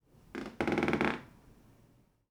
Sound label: wooden room squeak; anemoi